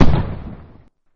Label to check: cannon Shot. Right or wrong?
Right